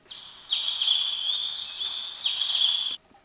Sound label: samsung sghe700 ringtone